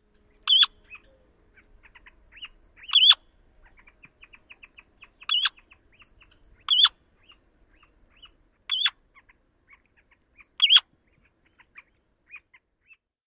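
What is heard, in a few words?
semipalmated plover kwee-up calls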